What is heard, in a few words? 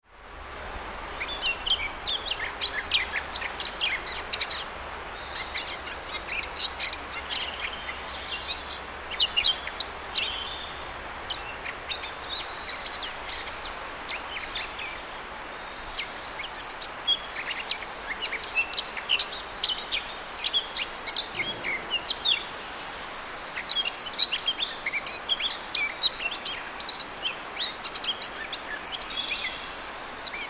Sylvia atricapilla; SETE